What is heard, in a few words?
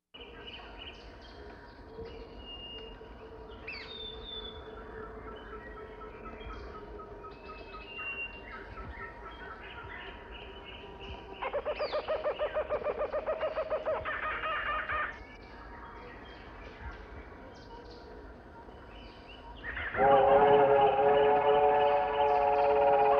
Rainforest